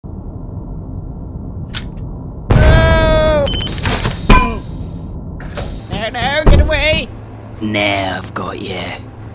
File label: Rocket Jump; Infiltrating The Airship